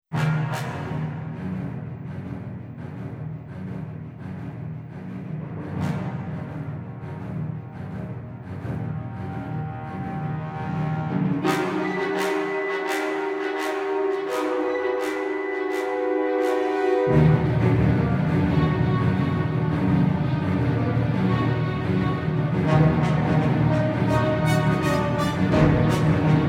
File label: Combat Music; Knights of the Old Republic; KOTOR